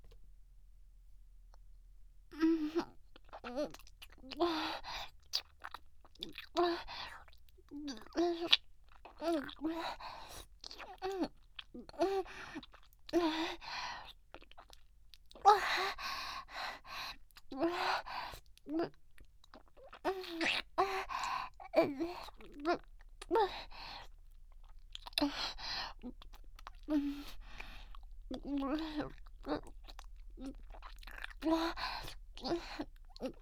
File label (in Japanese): 触れるような軽めのキス